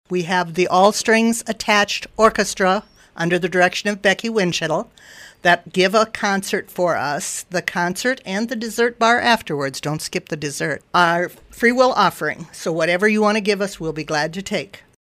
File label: Strings